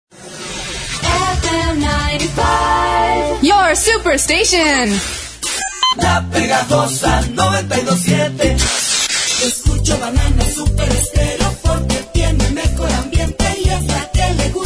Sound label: Jingle; Jingle mix 3